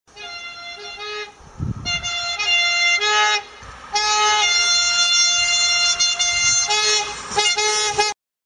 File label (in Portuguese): Buzina; locs elec; Comboios